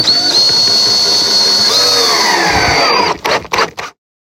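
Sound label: drilling noises that I used on a wooden frame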